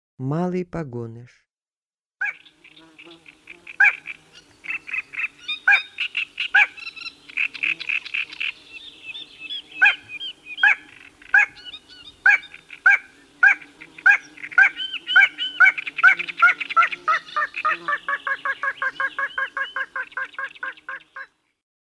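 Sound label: pogonysh golos, spotted crake voice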